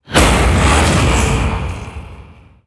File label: necromancer